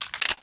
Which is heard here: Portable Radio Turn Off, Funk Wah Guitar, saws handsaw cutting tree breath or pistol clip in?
pistol clip in